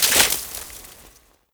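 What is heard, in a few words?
movement pick ice snow debris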